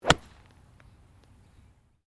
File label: GOLF DRIVE IRON; Various Golf sounds; Sports